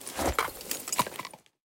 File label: Backpack Close; Custom UI Sounds; Insurgency Sandstorm